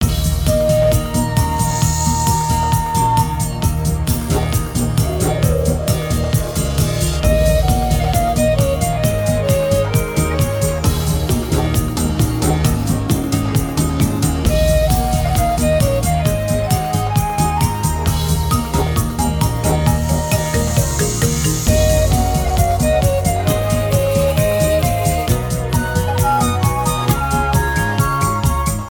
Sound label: free spin melody; Aztec Secret